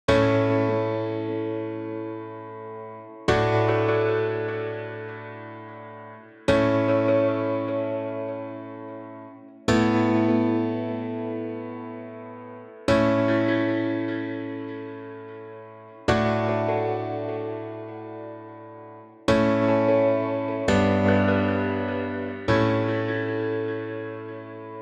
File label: piano